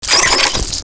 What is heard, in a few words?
bullet box